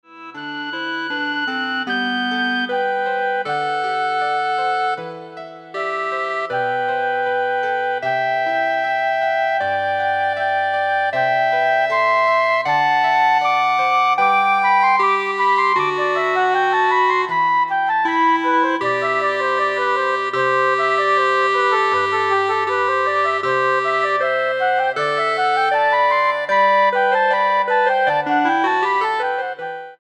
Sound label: Flute Clarinet Pachelbel Canon